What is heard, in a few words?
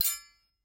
sword hit; soundscrate